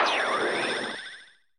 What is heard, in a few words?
Magic Wand